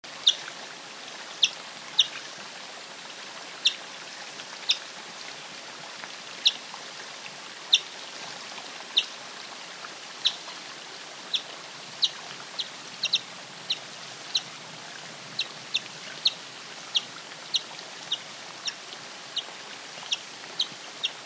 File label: puna yellow finch